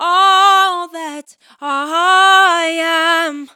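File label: All that I am C major, Vocals Phrases; GhostHack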